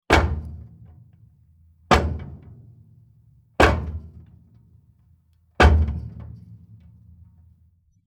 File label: Metal Footsteps; horror; horror sfx vol 1